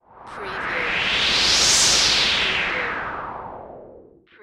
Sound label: Whoosh; Sound Effects Bible